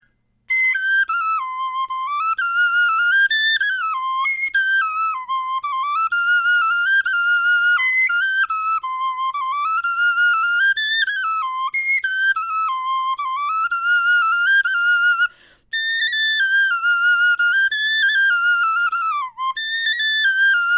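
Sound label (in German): melodie, Knochenflöten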